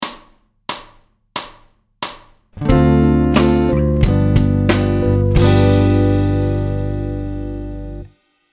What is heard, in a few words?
chord